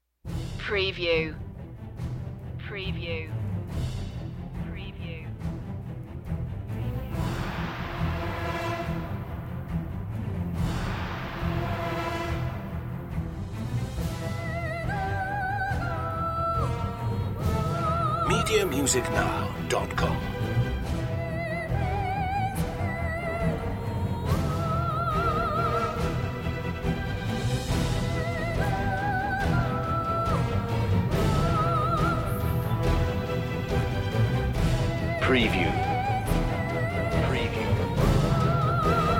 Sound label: full mix